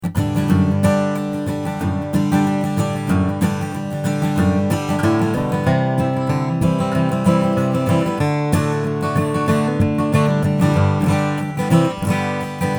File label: martin d 45e retro indian rosewood